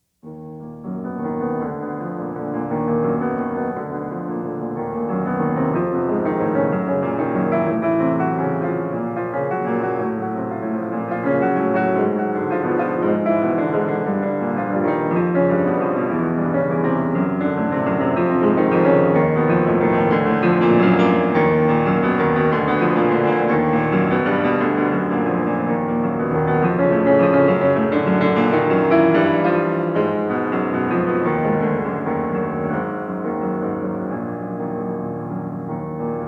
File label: Preludes; Chopin